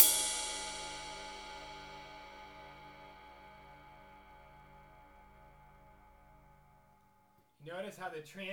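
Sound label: cymbal, Brushes; Roland - Rhythm Section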